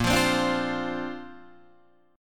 Bb Major Add 9th, Bb, down, Guitar, Open D

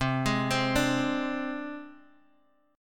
C Suspended 2nd Sharp 5th C Guitar Open D; down; chord shape

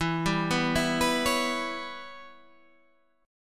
G 6th Flat 5th E

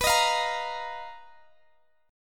B Major 7th Flat 5th B down Guitar Open G

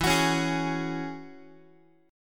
E 6th Add 9th E down Guitar; E6add9 strummed; JGuitar

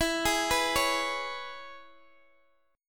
E 6th, down, Guitar, DADGAD

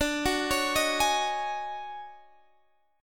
D Major 7th Flat 5th Flat 9th D, chord

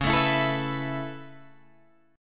C sharp Minor 7th Sharp 5th C sharp Guitar DADGAD

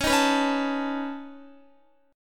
Db 7th Suspended 2nd Sharp 5th, Guitar; strummed; JGuitar

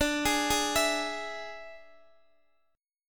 D Suspended 2nd Flat 5th D Guitar DADGAD

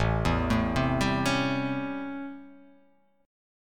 A 7th Sharp 9th; A7#9; arpeggiated; JGuitar

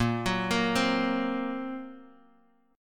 A sharp Suspended 2nd Sharp 5th, Guitar Open D

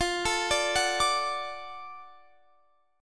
F sharp Minor Major 7th Sharp 5th

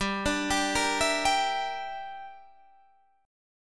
G Minor 6th, Guitar Standard; Gm6 arpeggiated; JGuitar

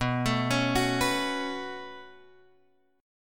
B 7th Suspended 2nd B down Guitar Drop D

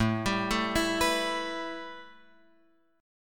A 7th Suspended 2nd, A, down, Guitar, Open F; A7sus2; arpeggiated

